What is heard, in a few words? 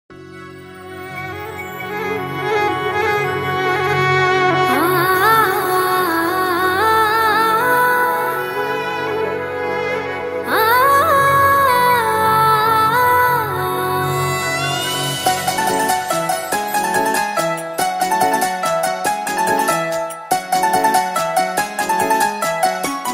SONG; Telugu Private Songs